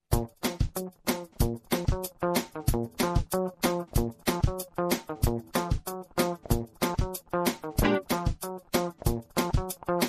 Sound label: Funky Blues